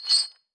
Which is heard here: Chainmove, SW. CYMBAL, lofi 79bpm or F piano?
Chainmove